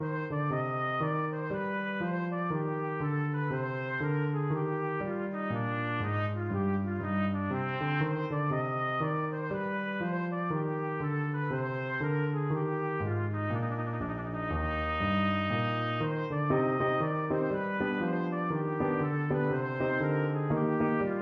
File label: bouree, trumpet; bach